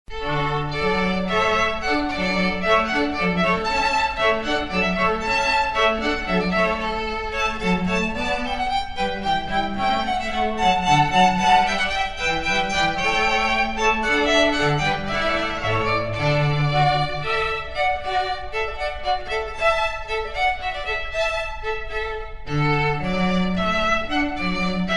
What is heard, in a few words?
Hornpipe, strings